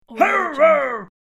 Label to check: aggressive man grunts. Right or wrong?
Right